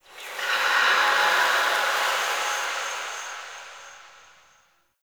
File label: Zariman Whisper Air Draft; Warframe; The New War; Ambience